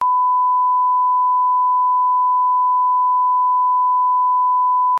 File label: Bleep